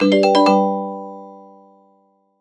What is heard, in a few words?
Coin